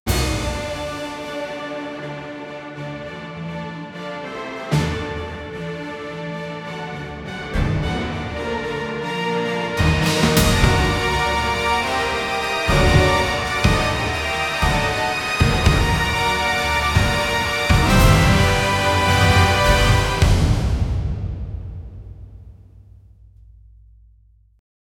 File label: teamwork montage epic hero